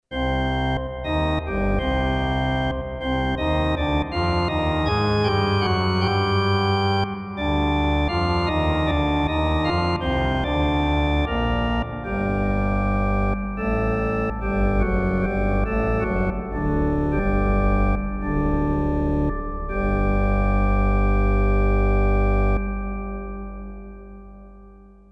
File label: pedal Pozoun 16, organ